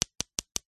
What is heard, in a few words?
industrial hammer tiny wooden hits stone fast; zapsplat